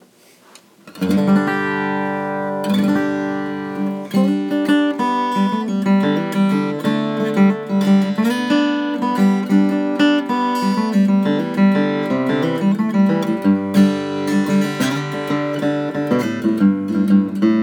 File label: klepper g; The first hour after tuning it up